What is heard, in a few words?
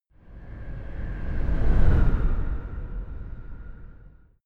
sci-fi vehicle train passing fast long; raumschiff